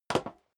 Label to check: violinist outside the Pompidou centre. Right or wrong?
Wrong